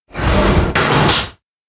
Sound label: door studio close; aino; anemoi